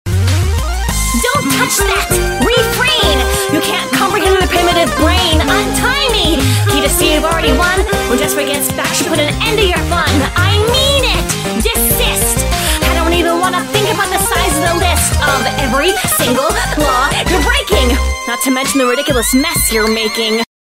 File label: Rap; Peridot